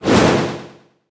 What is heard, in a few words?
axe